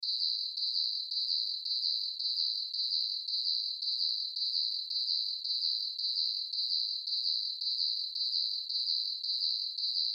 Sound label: Insect Chirping Bed Background; Insect Voice; Animals; Insects